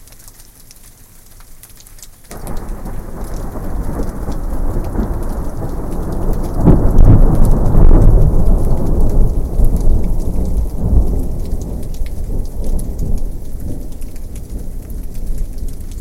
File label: better thunder